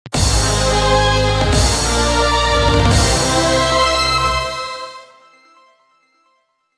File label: Misson Complete; super time force ultra